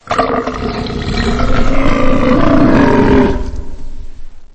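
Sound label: monster roar in a computer game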